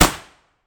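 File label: weapon ar suppressed fire player atmo interior; gmod; viper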